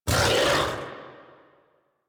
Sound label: Kubrow Feral Vocal Angry Attack Short; Warframe